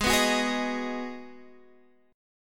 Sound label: B 6th G sharp, guitar chord